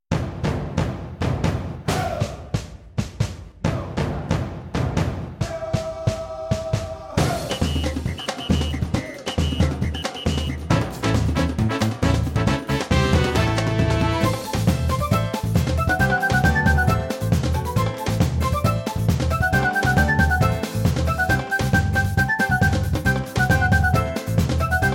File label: Samba; Angry Birds Rio